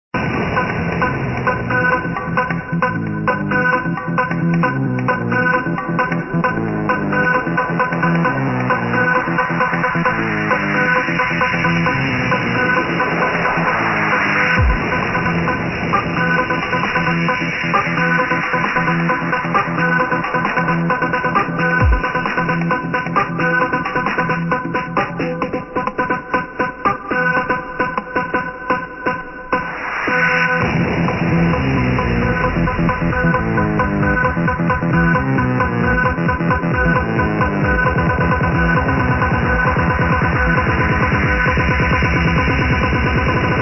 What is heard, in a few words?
groove instant